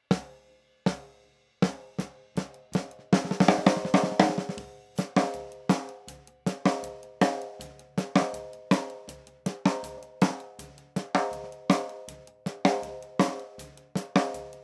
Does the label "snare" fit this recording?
Yes